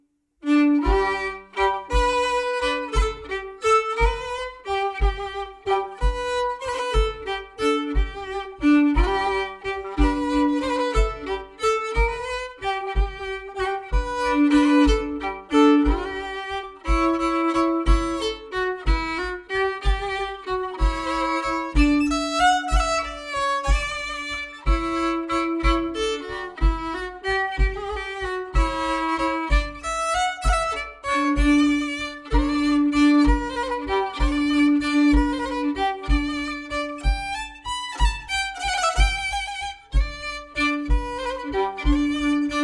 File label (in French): Valse